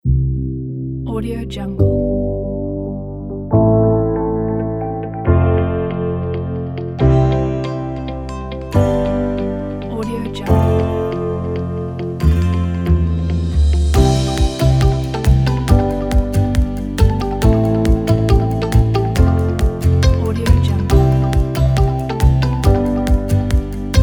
travel background music; brownbird

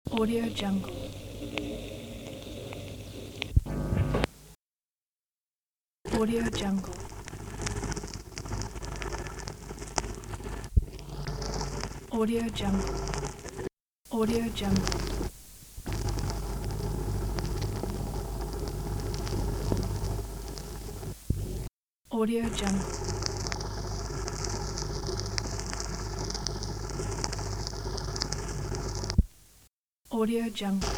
old tape film crackling

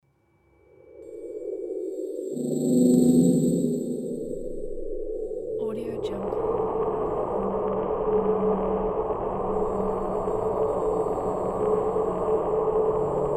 ambiance noise futuristic scifi

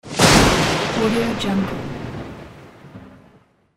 lightning strike

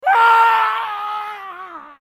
male death scream; hollywoodedge

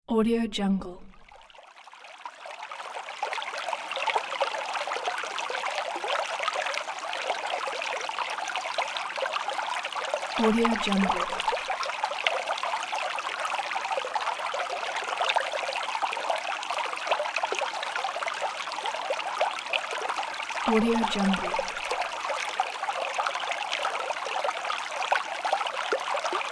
calm water stream

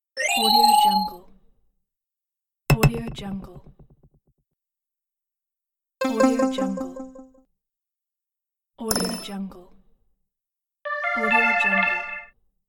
text message arrived

